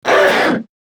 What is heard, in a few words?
Pain Grineer Manic; Battle Barks